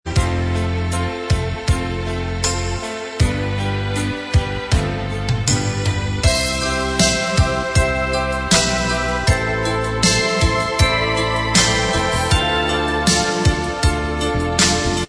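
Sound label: Instrumental Track, backing tracks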